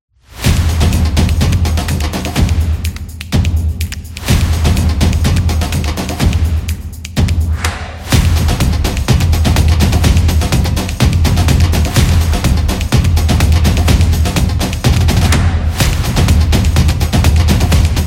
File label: Carnival Drums